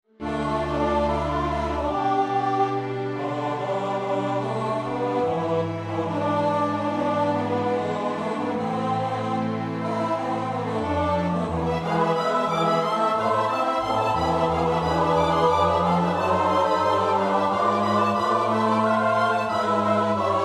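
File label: soprano alto tenor bass; Bach (Johann Sebastiaan); BWV 245 Johannes Passion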